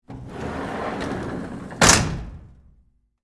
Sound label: Cabinet Drawer Close, Metal; Theatre, Gels